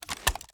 wfoly plr sm reload xxmag fast start, iw8; gmod; uzulu

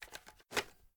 reload end; gmod; viper

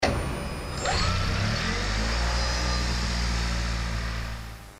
engine start, prius; gmod; tdmcars

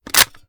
pi reload empty boltopen; gmod

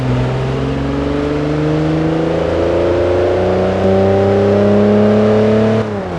second, vehicles; gmod; tdmcars; rav4

